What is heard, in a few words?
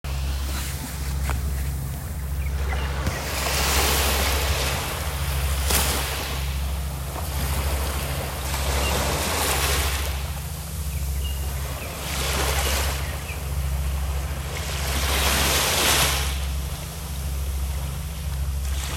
Waves